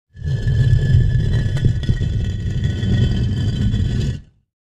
Large Block Scrape, scrapes

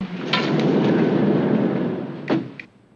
Elevator Door Slide, elevators

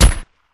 Gun Shot Silencer, silenced singles

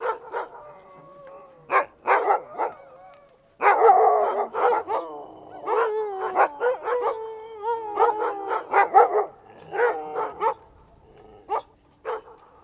Dog Barks Howls, dog

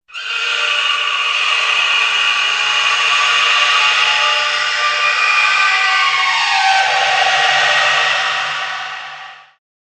Screechy Dry Ice Scr, ghosts

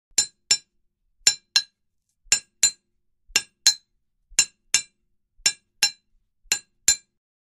Instruments Hammer Sm, hammers

